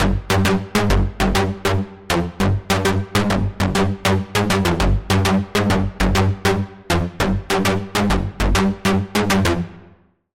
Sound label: Pluck, Sylenth1